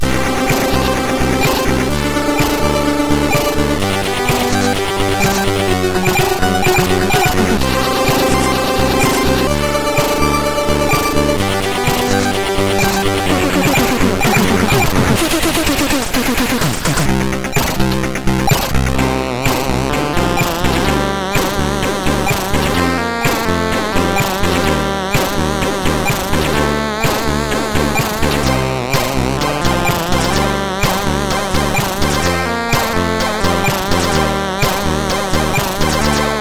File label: drums intro, MOS6581R2; Addlogic Drums intro; hvsc